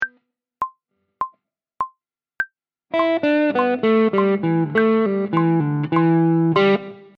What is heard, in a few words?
lick blues; guitarra; 3 licks de blues